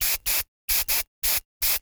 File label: Household Hair Spray Can Spraying Multiple Times